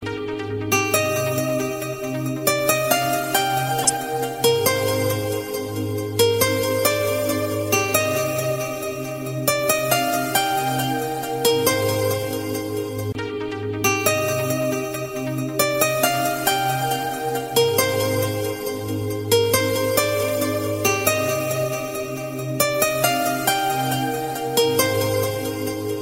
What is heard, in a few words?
instrumental mobile ringtone